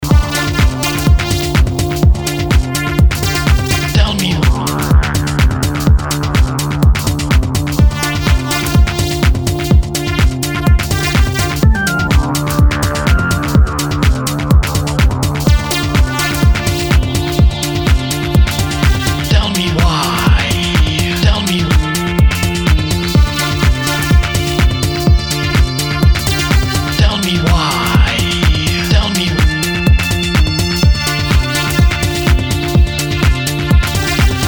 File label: Electro 80s